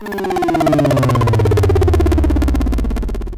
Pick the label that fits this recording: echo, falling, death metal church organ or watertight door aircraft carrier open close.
falling